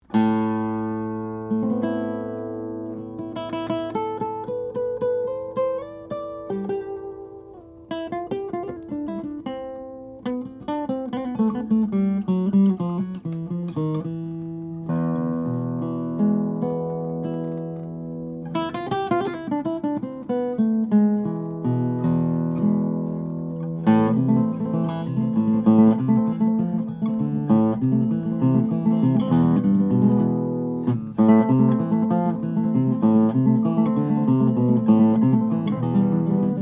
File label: RainSong V PA9000NSC Custom, nylon string guitars, crossover guitar; carbon fiber; cedar